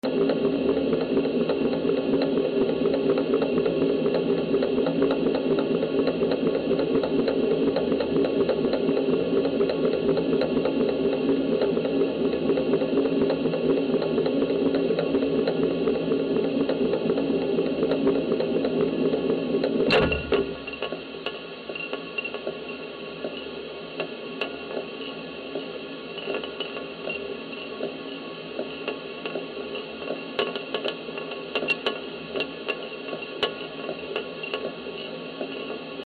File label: Meter Turning